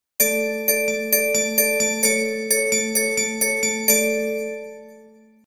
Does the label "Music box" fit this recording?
Yes